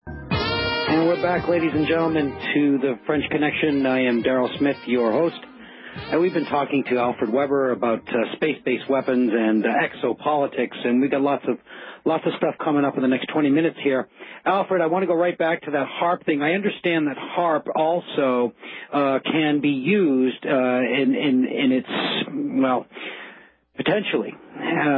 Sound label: HARP